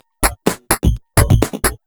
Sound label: Electro Loop 128BPM; Vengeance Samples